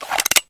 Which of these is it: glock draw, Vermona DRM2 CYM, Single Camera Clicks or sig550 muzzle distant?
glock draw